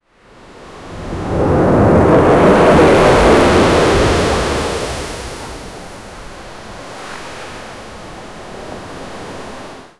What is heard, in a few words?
tsunami in the ocean; disasters